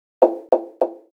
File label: haptic lock key hover; Sharp AQUOS sense lite SH-M05; sharp audio